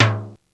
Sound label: TIMBAL L, M1 DRUMS; East West; Global Sounds